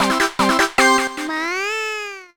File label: Jingle, WINNER